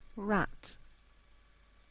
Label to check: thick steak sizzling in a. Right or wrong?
Wrong